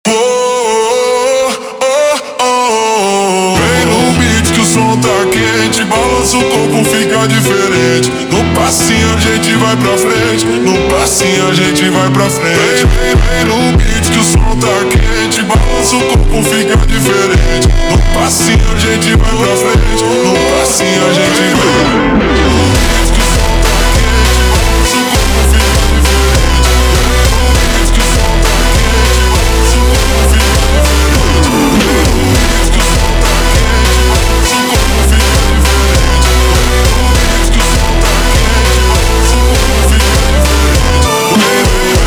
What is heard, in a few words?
Montagem slowed